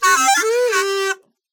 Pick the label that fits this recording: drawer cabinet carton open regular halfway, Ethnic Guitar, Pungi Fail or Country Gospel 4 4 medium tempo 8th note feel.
Pungi Fail